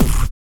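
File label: vox percussion, Vocal Planet Jazz FX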